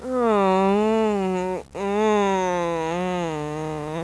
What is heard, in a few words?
groan